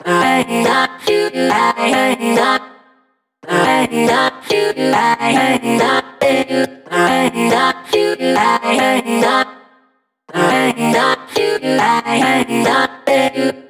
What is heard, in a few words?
Future Bass Vocoder Loop 140 BPM F Min; Vocals, Chop Loops; Cymatics